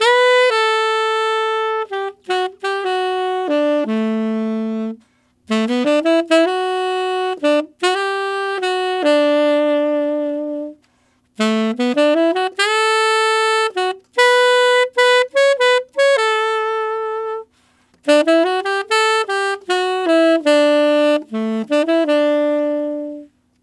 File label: Tenor Rock Ballad in D maj, Tenor Sax Loops; Sonic Foundry (Sony Creative Software); Jazz Solos and Sections